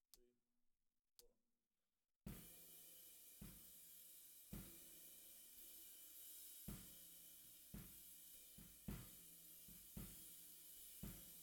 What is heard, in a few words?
SNR